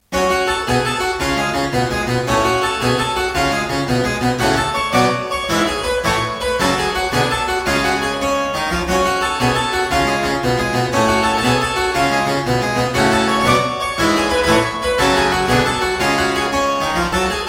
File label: Suite no 9 in G Minor - Gigue, spoken; Johann Mattheson; The Twelve Suites of 1714